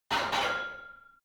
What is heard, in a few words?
barbell heavy plates replace rack reverby, fitnessstudio